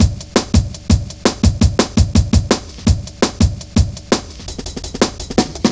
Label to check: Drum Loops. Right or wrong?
Right